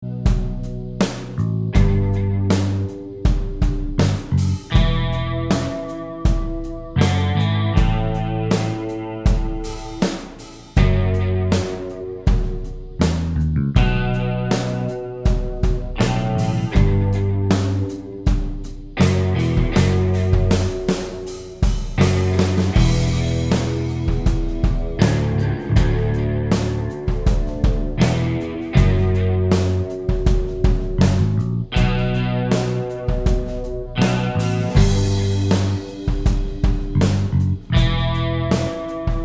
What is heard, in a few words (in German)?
Drums Bass und Gitarre